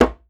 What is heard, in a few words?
djembe; Voice Crystal - Steve Reid Definitive Percussion